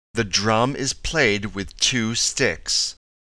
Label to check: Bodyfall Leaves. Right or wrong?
Wrong